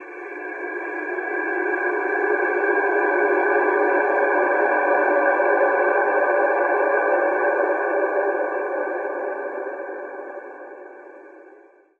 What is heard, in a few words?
texture billion years, One Shot Textures; Transmission-X